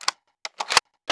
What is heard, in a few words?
ar2 reload; weapons